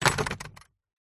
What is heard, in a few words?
household gate wooden with metal latch close med